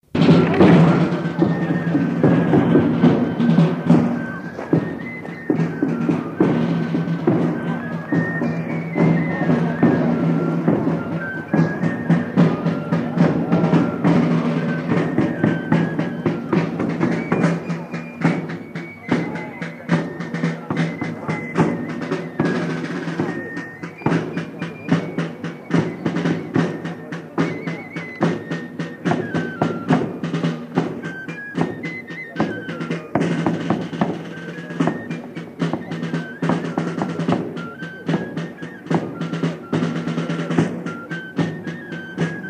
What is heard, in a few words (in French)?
fifre